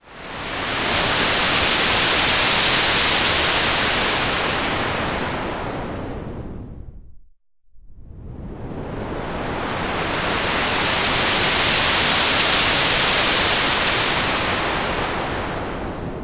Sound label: noise filter sweep